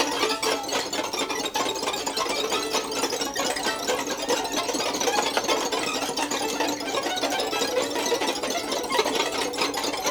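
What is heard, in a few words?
VLN COL LEGNO, Violin; Q Up Arts; Denny Jaeger Master Violin Library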